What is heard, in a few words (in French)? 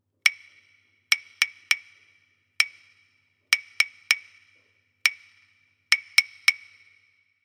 impros claves; loto sonore